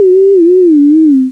you died; Prima v0.1.1; Prima Sounds

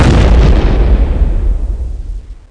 EXP LRG

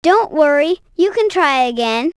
lose

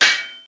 parry

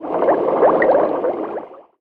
Potion Brew; kult

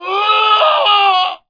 death; Daikatana

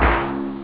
drums snare; OctaMED SoundStudio V1

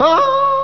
Ship running; Desk Invaders II 2.3

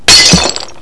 Glass break; Olduvai Fonts & Sounds

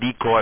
decoy; JSF demo

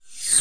rev-cym; Select Soft Publishing Stock Clips Multimedia Clips

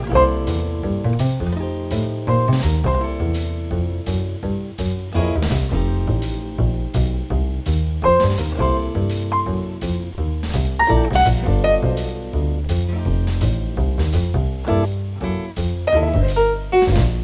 jazz

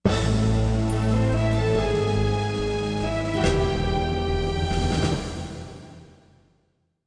sting; Ruthless

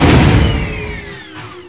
door; AGGRESS; 501 Great Games - Volume One; Guildhall Leisure Services

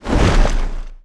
eagle wing

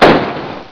bang

